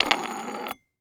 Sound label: Weight Lock Screwing On Metal Weight Lifting Bar; Sports